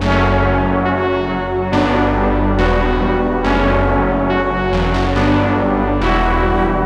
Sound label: 140 BPM F# Min, Analog; OB6+P6; Jupiter; Cymatics